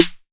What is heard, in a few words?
808 SD Tape